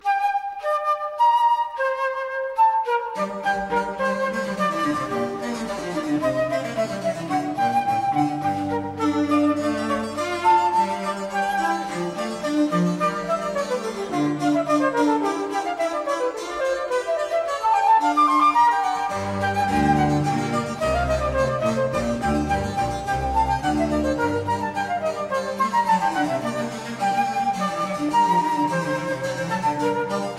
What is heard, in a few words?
Sonata in g minor for flute and b.c., Allegro; RV 56 from 'Pastor Fido'; Allegro assai